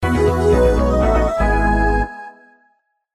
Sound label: Jingles; otome the exorcist